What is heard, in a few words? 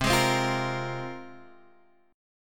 C 7th Suspended 4th Sharp 5th; C7sus4#5 strummed